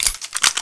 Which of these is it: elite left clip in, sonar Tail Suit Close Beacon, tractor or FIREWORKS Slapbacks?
elite left clip in